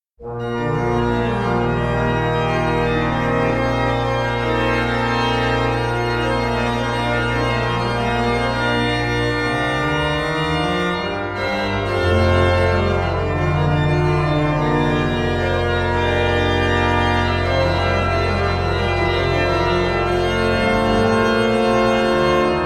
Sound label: Sonata in B-flat Major; Mendelssohn; Op 65 No 4